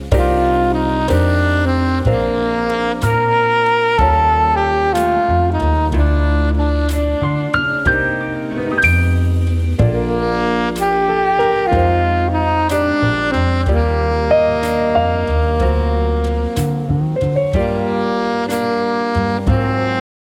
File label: christmas song, sax